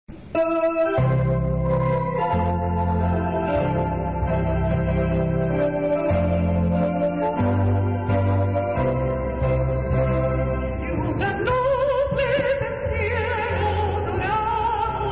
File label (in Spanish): himno de sogamoso